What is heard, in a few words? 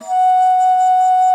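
F#5, bass flute; Xsample VOL-2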